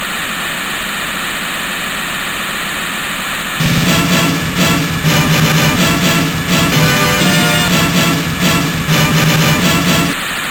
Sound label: take off jingle; Operation Harrier